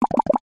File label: multimedia game designed pop wet seq